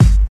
rich mid range kick one shot g key, Royality free kick drum sample tuned to the G note.